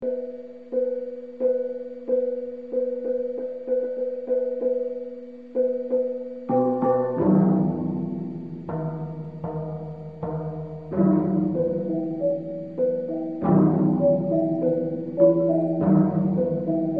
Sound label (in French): vn hoa bin concert gong